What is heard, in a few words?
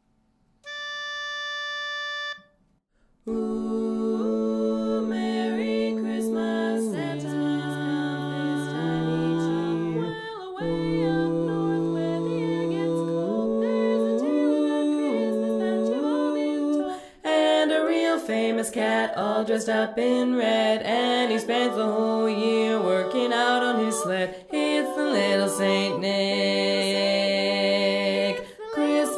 bass